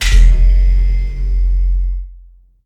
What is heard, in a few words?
Baking Ovens Start